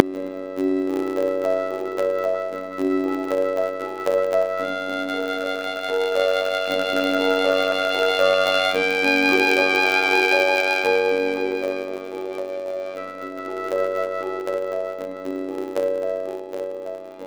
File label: piano